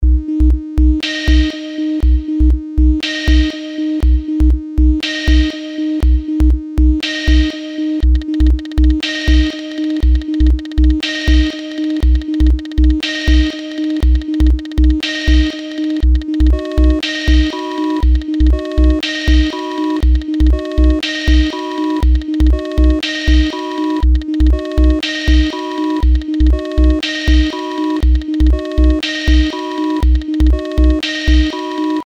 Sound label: sine wave